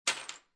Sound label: Keys Drop On Wood Table, Foley, Keys Dropped Onto Wooden Table; Drop Sound Effects